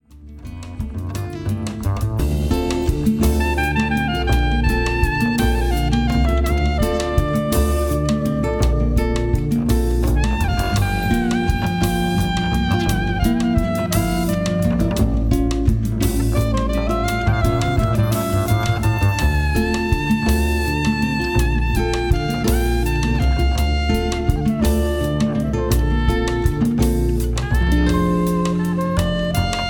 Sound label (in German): Sopran Saxophon